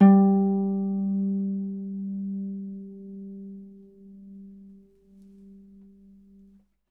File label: Harp G3; Miroslav Vitous - Percussion & Harp